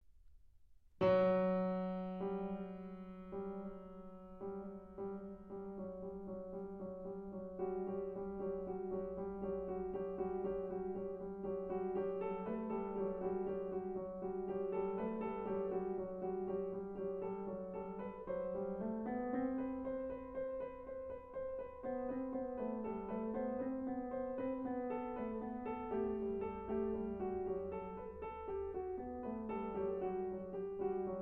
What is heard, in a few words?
Buzzing, Piano; Book 2; Mikrokosmos, Sz. 107; Bartók; Complete Solo Piano Works